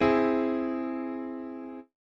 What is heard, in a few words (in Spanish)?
5 aumentada